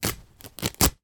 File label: foley cloth rip; sound effects one